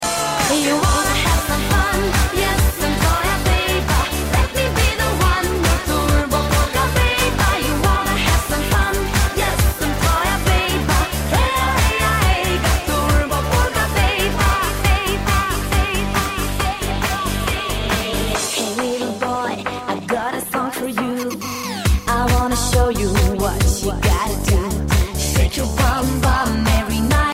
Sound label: Polka